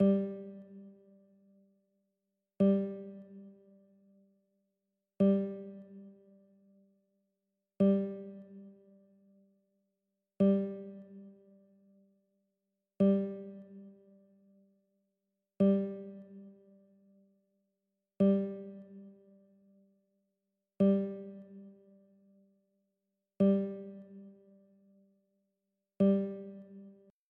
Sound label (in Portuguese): amostra de pulsos com periodicidade